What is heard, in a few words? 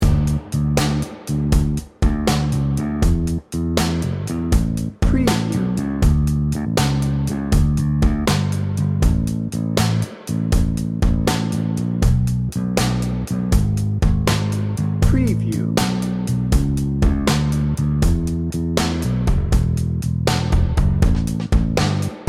o come all ye faithful